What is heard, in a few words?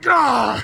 pain severe; garrosh